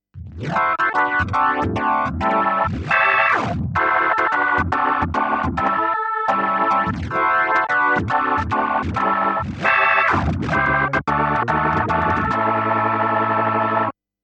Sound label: organ B3; kurzweil artis